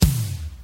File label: rich high end kickdrum sample a sharp key, Royality free kick tuned to the A#